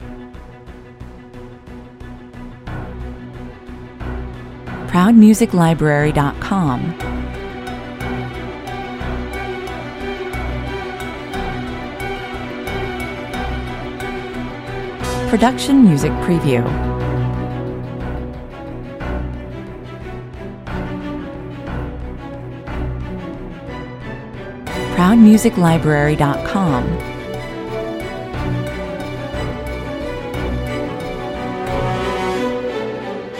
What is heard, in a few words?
no choir no perc